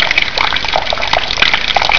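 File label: rain drops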